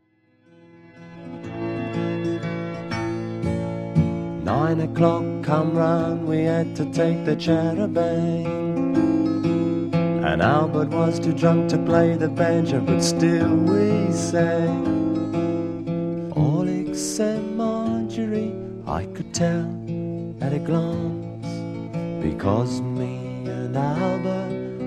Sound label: Waltz